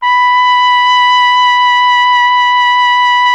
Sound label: BRS TRUMPETS, Roland LA; Roland - LA Composer vol. 1